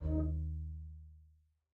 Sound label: lower oneshot hoover